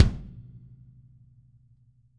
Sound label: kick, Drum Kits